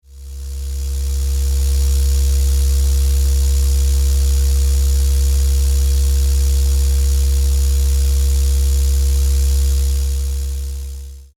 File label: cymatics test with mustard seed and max